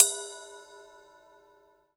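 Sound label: ride, drum machines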